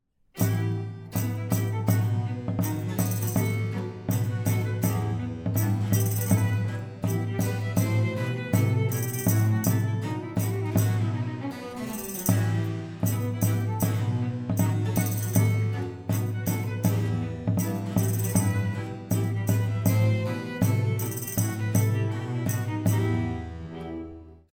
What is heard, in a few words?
percussions; couplet; baroque; forets paisibles; Les Indes galantes